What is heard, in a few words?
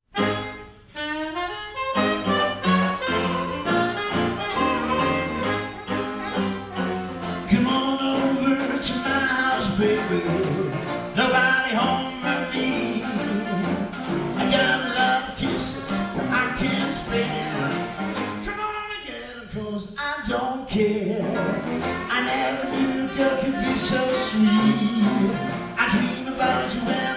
Washboard Band